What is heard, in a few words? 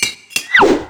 Boss Attack